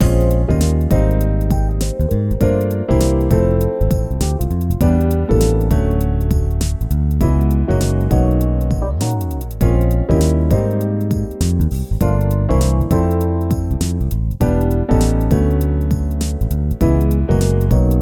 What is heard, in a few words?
main music; RS Games Sounds